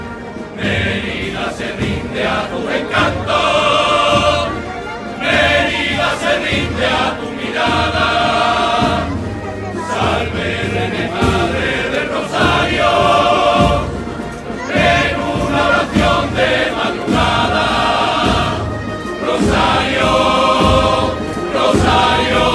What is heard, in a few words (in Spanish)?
SONIDO CORO; DE MERIDA ROSARIO